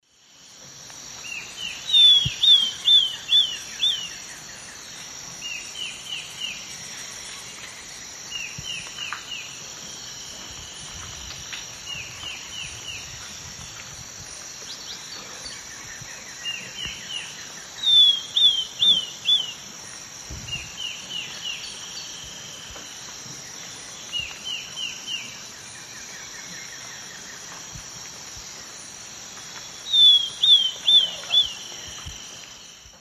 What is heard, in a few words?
Pachyramphus castaneus, song; S. America; Eastern Lowlands